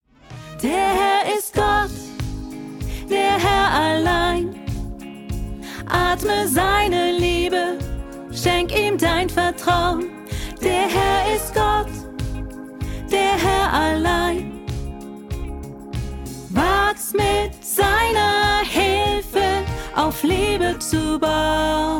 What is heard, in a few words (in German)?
Lern Sopran; Gerth